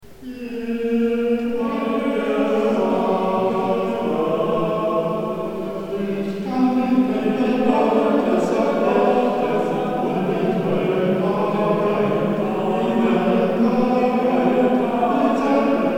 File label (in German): Zwei falsche Zeugen Er hat gesaget; Sch tz